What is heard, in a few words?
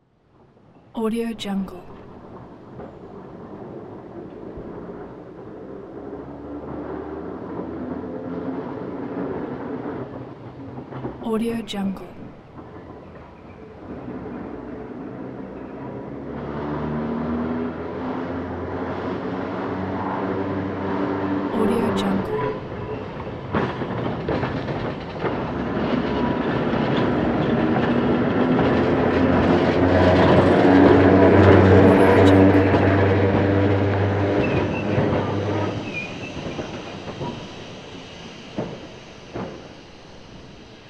vintage electric tram passing